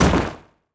Impact

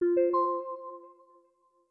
Windows notify system generic; Windows 8; gamerip

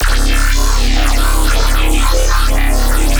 Bass Hyperphase 150 C#; Jino

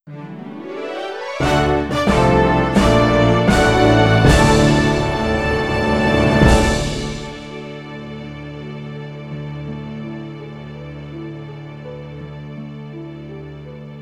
Boat Game Victory; legend of zelda the the wind waker hd

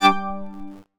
DIALOG WARNING; gamerip; PS3

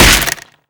Wood Take Hit; Destructable

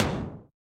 Mech Footstep Metal; StyxMech

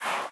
Rotate

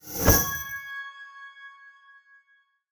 winscene fireman stinger

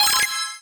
Block Combo; Ui Block Combo8

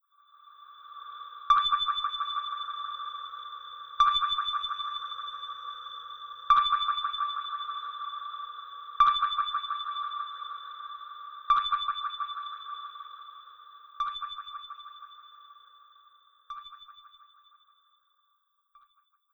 Space Ping

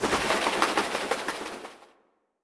Bird Fly

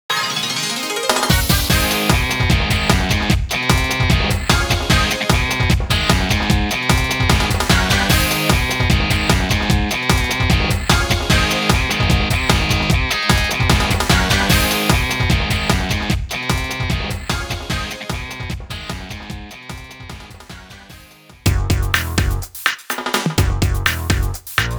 Short Loops; AliceSoft Sound Album Vol 04 – Big Bang Age

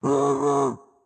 Leap; Caffiend Caelan

